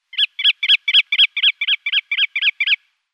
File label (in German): Pfuhlschnepfe Limosa lapponica